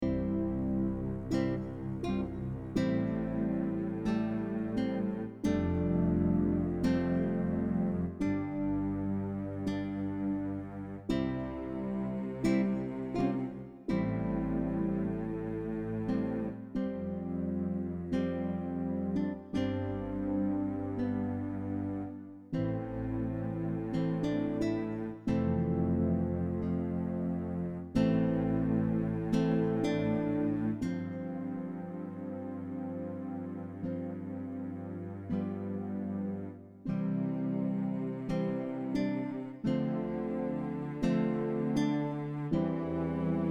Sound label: Strings & strings